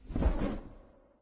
wingflap; smite; thanatos

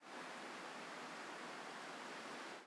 Defeat Wind; Smite; Susano

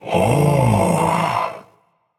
nv discover ah; smite; voicepack; ganesha

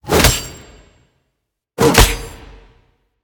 Victory Attack; Susano; Smite

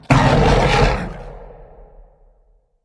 intro growl; smite; Anhur

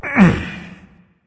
nv grunt lift; cernunnos

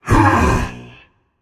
breath, voicepack; smite; jorm

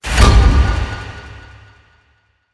activate; Smite; Vulcan

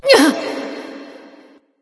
grunt hit light; smite; voicepack; hel

